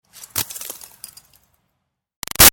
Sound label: Ice Rock Drop Small Scrape Tinkle; Materials; Rocks